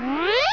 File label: Sax Scram; The Cruncher 1.1 Demo; Cruncher Candy